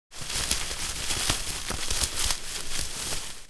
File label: Fishing net handling rustle